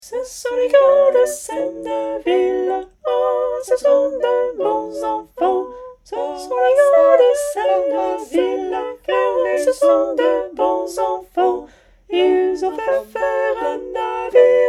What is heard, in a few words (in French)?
Sopranes; Les Gars De Senneville